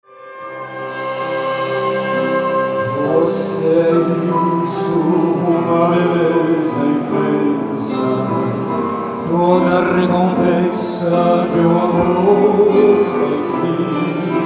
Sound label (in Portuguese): TENOR E ORQUESTRA